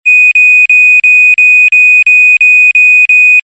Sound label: alarms alarm hotel fire